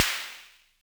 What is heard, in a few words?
Snare, Univox SR-95